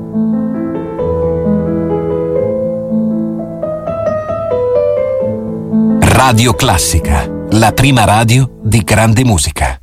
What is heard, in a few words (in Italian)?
classica